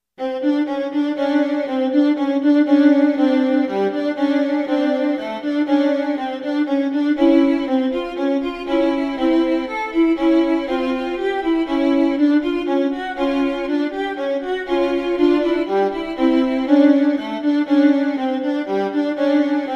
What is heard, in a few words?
violin solo